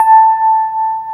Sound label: PIA DX E-PIANOS